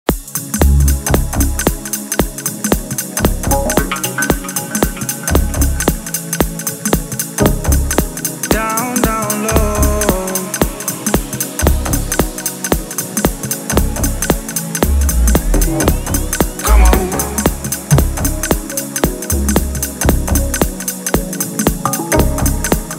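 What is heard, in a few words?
deep house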